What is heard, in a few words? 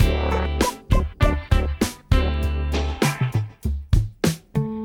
loop